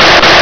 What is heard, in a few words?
radio on, ambience; caged